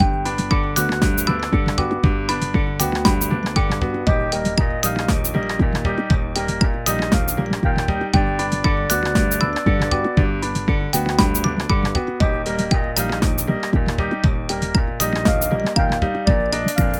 Music; Mg Everleader; evergirl

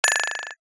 Error; Dr. Driving 2; Android

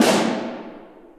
Snare

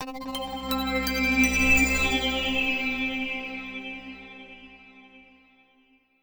lvlup mothership; rymdresa

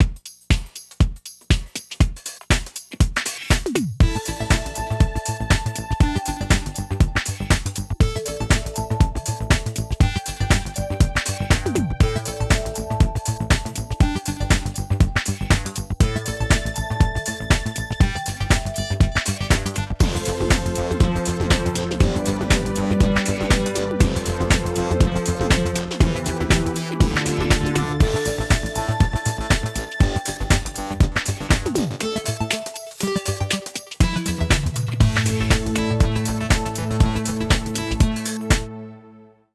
disco; My Sims

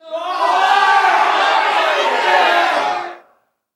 Crowd Angry; Prison Architect

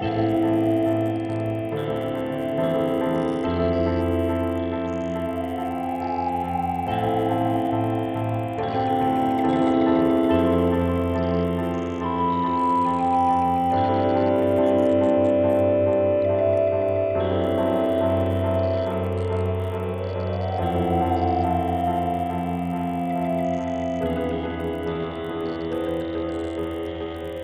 Trainride Stationary Slow; rive wreck hack die retry; ps4

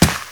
step, rock climb; Birthday Party Bash; Wii

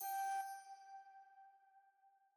Alchemy Flute Combo

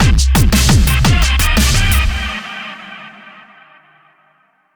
DramaticEvent

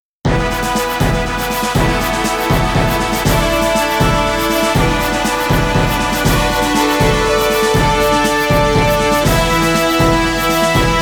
Victory; Angelic Vale

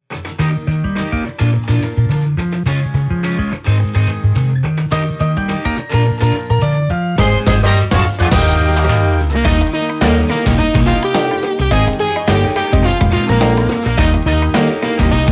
Music Menu event

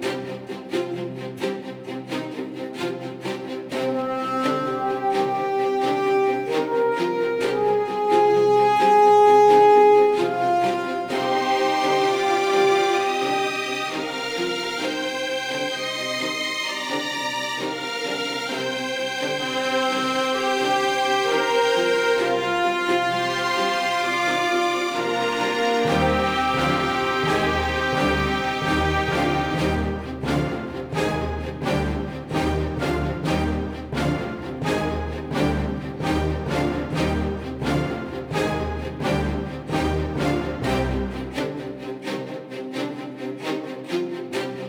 Bossbattle Layer 130bpm; Airheart Tales of Broken Wings; PS4

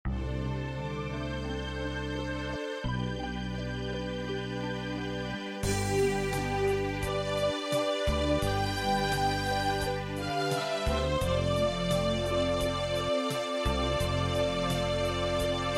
MIDI Theme; Deadly Sin

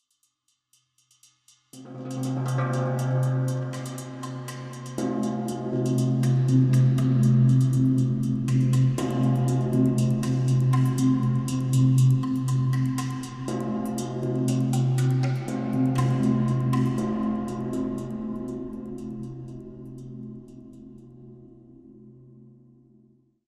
Great Wall Front

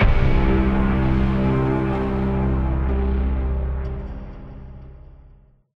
Logo

